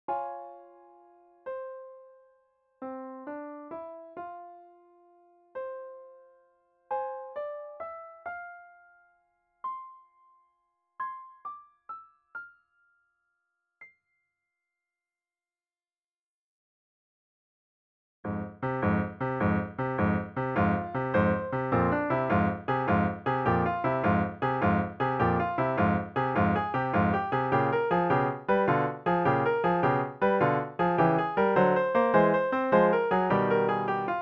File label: sorcerers apprentice piano